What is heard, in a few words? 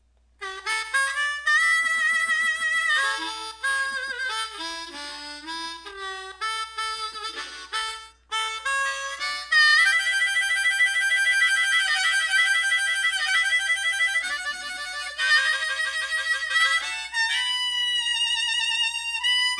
slow harp